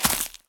land vines; Scrat

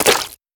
Footsteps Swamp; We Are the Dwarves

